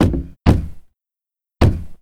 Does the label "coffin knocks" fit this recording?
Yes